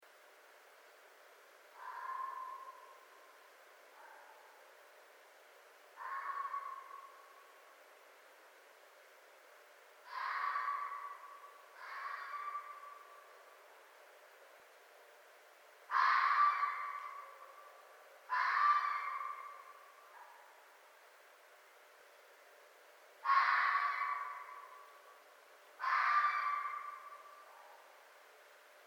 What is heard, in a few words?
Red Fox, mammals; North America